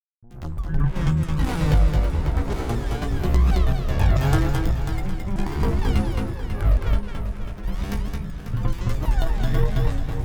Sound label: kick explorations